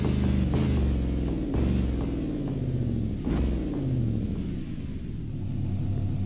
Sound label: throttle off fast, vehicles; ambulance